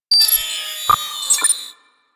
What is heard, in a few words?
Computers Random D; Warframe; Ambience; Grineer; Grn Computer Room 1